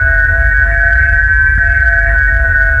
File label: manta idle loop; ambience